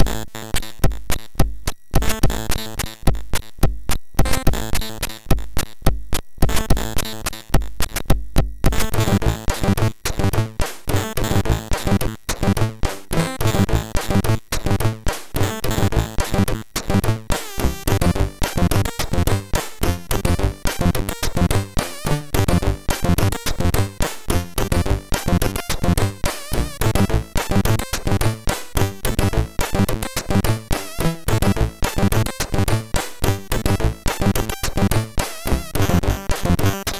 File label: Beatbox, MOS6581R3; Pinball Dreams